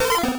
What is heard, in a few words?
cry